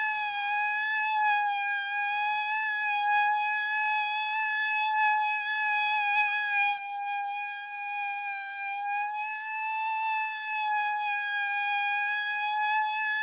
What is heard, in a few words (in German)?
Mücke